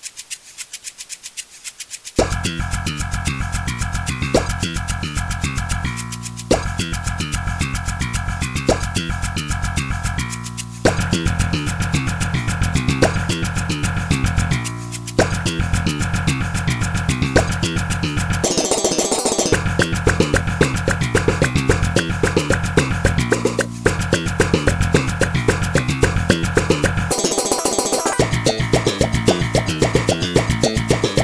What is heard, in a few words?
bg music